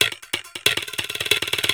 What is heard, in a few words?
percussion loops, Techno, 138; PS-45 WAV Techno ID